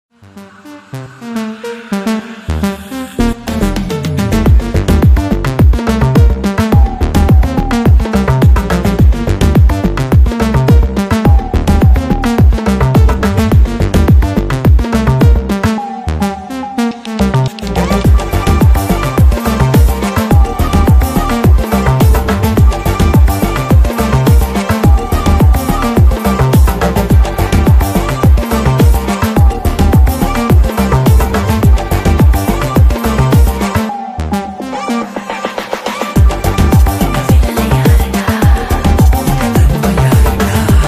bgm ringtone tamil ringtone; leo villain

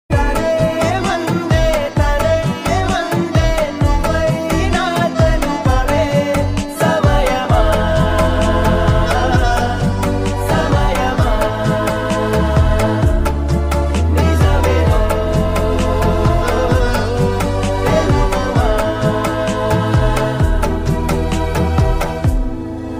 song ringtone, telugu

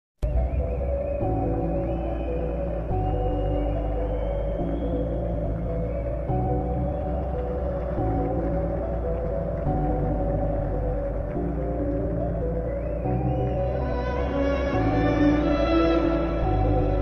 climax ringtone bgm

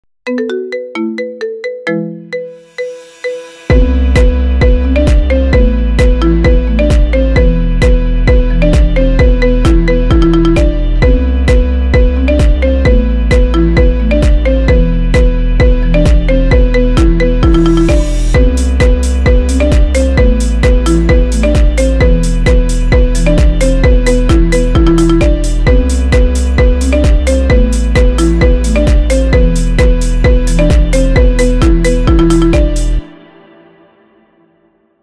marimba ringtone; giga chad

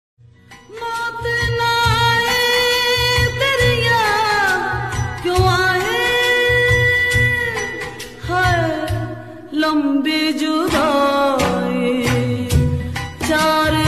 sad ringtone Hindi sad ringtone; vidai ringtone

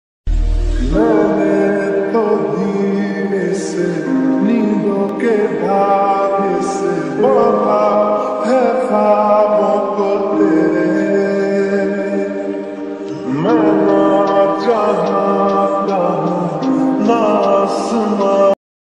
lofi ringtone bgm ringtone

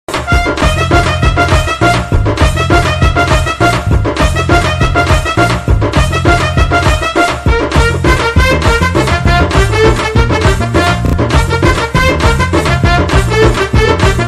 bgm

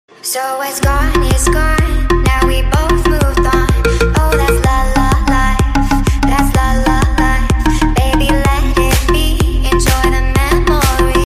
popular ringtone

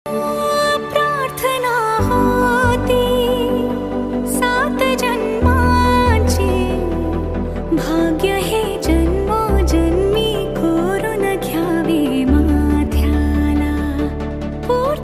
song lofi; riteish genelia deshmukh

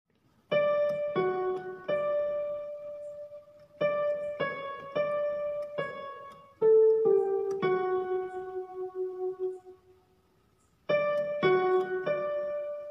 tamil movie climax bgm keyboard notes; irugapatru tamil movie 2023

